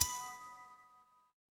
feedback success

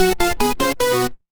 retro game game over

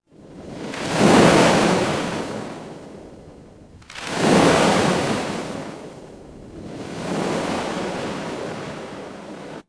ambience ocean

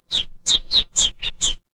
animal bird

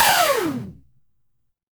retro game laser

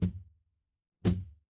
impact thud

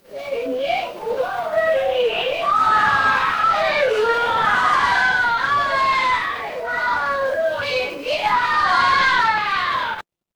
crowd cheer

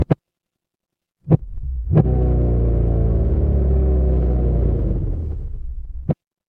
mechanical engine

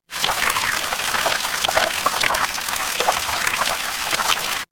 water stream

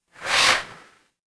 ui swipe

